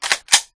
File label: switch; scorpion; guns; resurviv